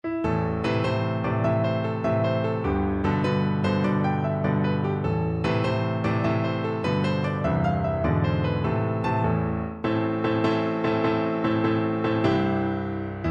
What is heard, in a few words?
johnny marching home, Tuba